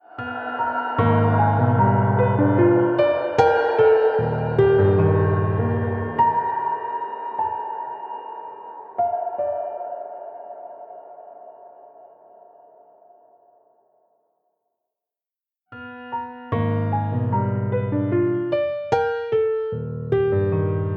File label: Piano Dimensional Tension; Temperance Pro